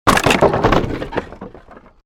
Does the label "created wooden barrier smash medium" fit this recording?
Yes